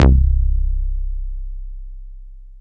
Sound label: BSE C, BASSES, House